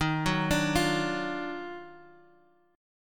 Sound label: D sharp Minor Major 9th, Guitar, Open G; 13,11,12,10,x,x; chordshape